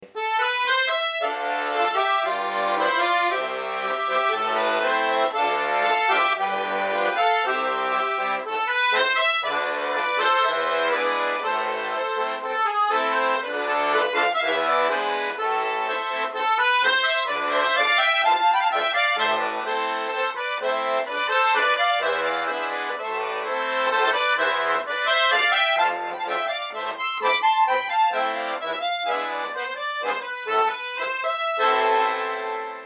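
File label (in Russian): два тенора пикколка, гармоника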